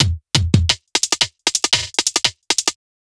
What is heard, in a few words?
BREAK 174